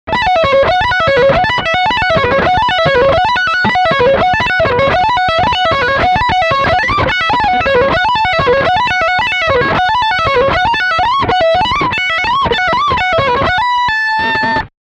Fast lick Steg humbucker

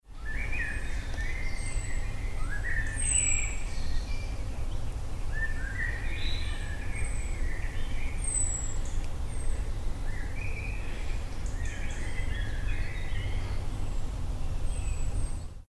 Wald Atmo zuerst Gerät nach oben gerichtet

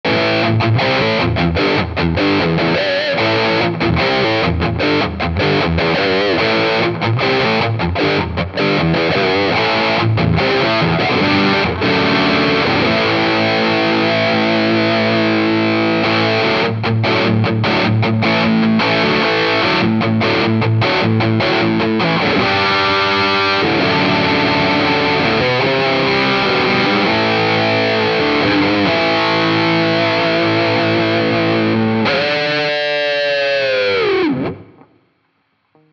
Floorboards im Vergleich Highgain Pure Amp Headrush Core Prime

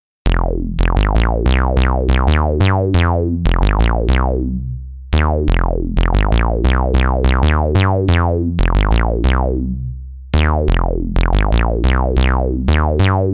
Resonanz Bass C

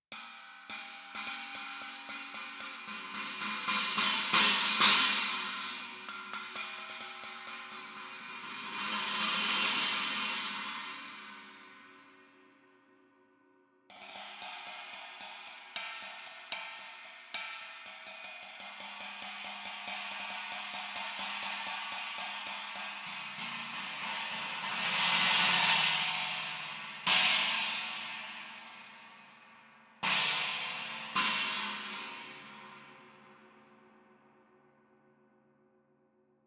Chinas

